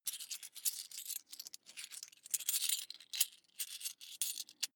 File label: coins big search long